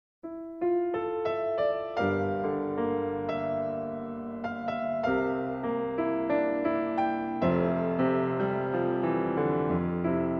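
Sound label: Andante, piano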